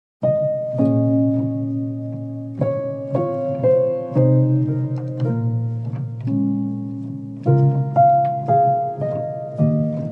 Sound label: song calm nature; dolphin tunes